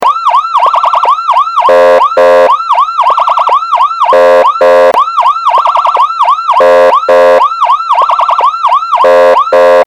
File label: intersection